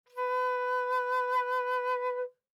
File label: Flute vib ff B4 stereo; Woodwinds